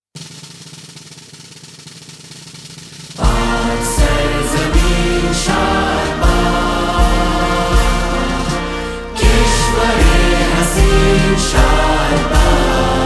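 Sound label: National Anthem Pakistan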